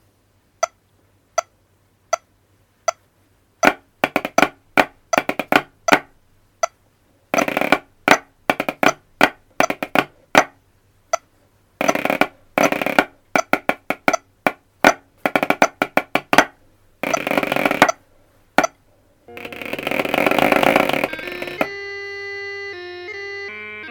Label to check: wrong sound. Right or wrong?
Wrong